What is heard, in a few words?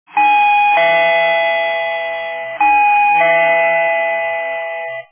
Ding Dong